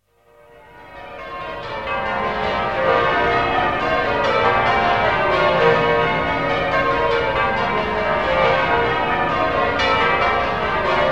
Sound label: bells cat; bbc